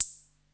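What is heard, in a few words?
TR66 open hi-hat; Crypton - DrumBase5000; TR-66 #2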